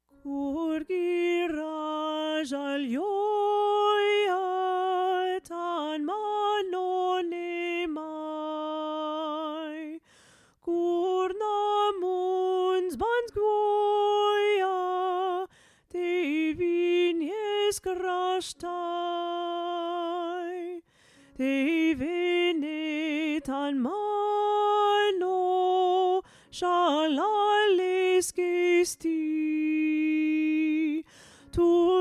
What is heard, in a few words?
Kur Giria Soprano